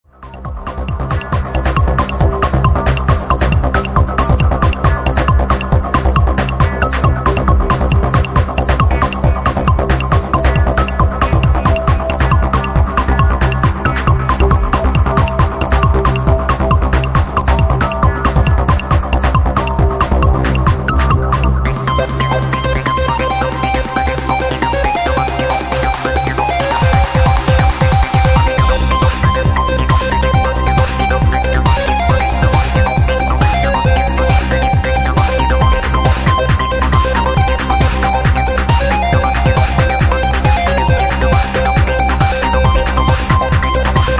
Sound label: LFO Bas Mix